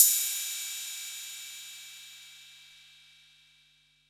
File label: 808 CYM, TR-808